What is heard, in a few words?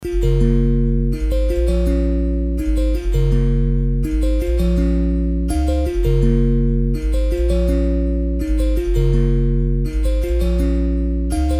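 A minor 165 BPM Bells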